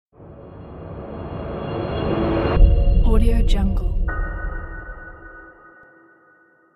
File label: thriller transition